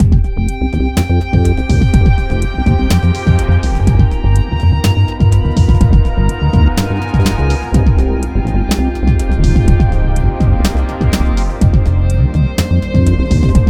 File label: House F min; oneMusic